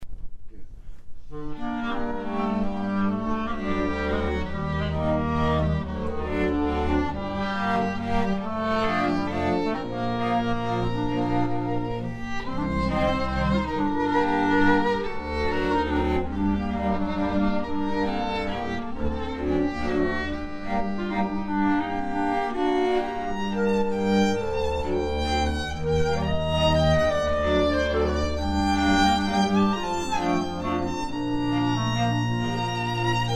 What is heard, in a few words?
WALTZ